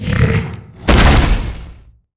gnome death; Akeron

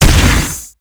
Giantswing Att; Ragefighter

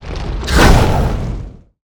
Darkness King attack; Swamp Of Darkness

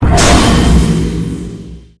lightning shock; MUDevs S20.1-3

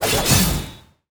attack; Urke Elemenal Knight

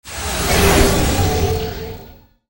summoner skill requiem; MUDevs S20.1-3

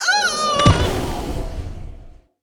magician death; Scorched Canyon

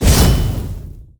Wind Glaive; Illusion Knight

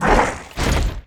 Devil Sword death; Karda Mahal Underground Temple

